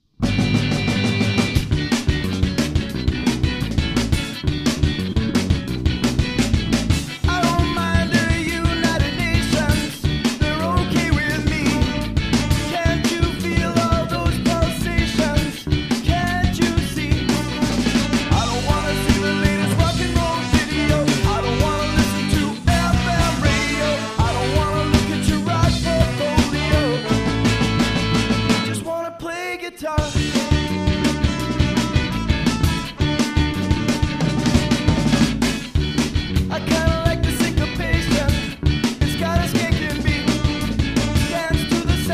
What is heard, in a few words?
play guitar